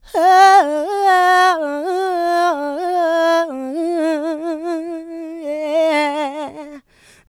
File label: Vocals, Adlibs; Houseworx